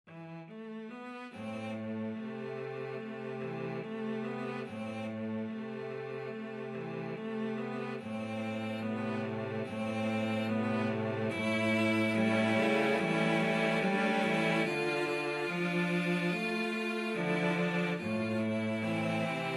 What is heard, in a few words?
cello quartet